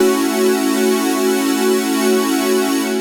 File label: Dream Pad maj 6th; Keys; Pads; Drum & Bass